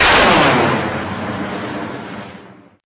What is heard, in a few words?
missile; mcheli